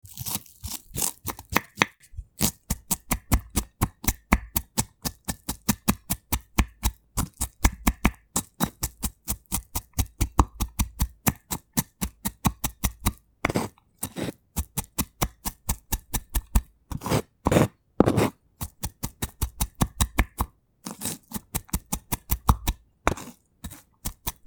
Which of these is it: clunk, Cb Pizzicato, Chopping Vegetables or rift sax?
Chopping Vegetables